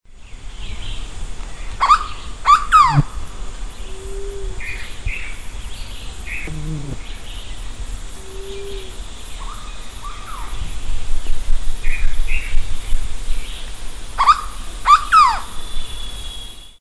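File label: Carpornis cucullata, Intervales State Park Brazil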